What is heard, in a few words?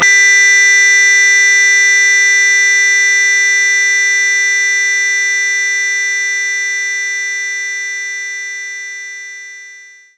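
Guitar Harmonics Eb3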